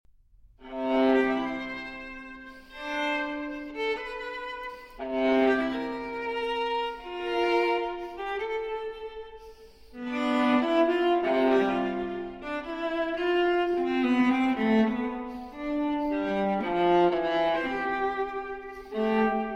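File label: Cello Suite No. 3 in C Major Transcription For Viola Sarabande; J.S. Bach; BWV 1009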